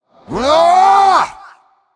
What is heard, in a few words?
battlecry